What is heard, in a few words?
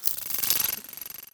liquid metal fly